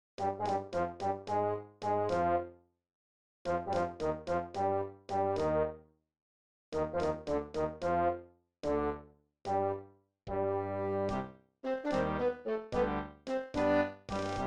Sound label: funeral march marionette PLAYBACK